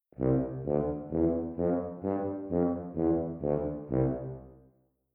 stacc

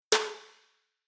Reverb Delay SD; Mix Insert

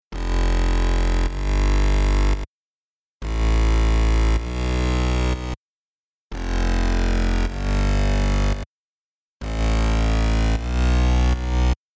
Future Bass Rhythm Bass Bass Sub

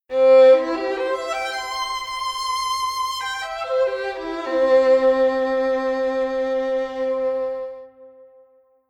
Violin Solo

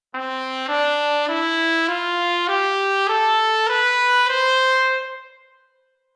Legato On

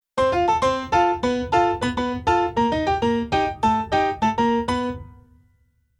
Keyboard Pf Latin Pf

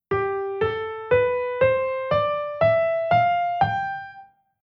Chord mode G Mixolydian

